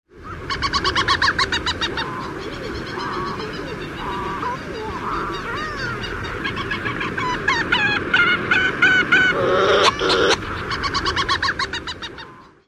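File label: calls from a colony, bird; NZ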